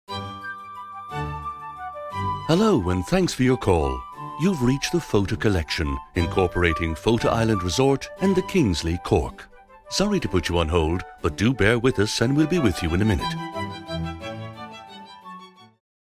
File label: On Hold